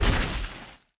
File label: Whoosh